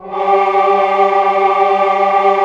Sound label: SYM CHOR G 2, Korg; Back In Time Records; Korg Universe VOL-1; Korg SYMPHONY2